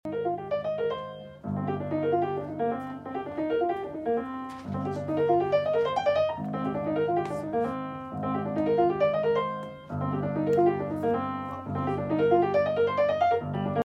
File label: Downtown Jazz; Tetris Effect